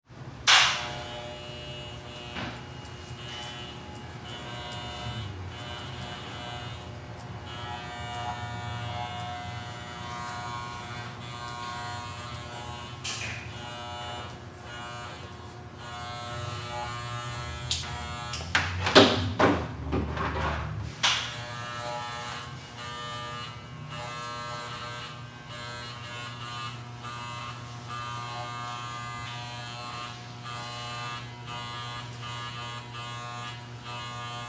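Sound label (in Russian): звуки машинки для стрижки волос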